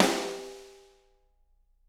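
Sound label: snare drum; Bob Clearmountain Drums I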